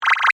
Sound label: Beep Button Chime, Scifi, Beep, Button, Chime, Science, Fiction; Tech; BlastWave